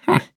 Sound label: mmh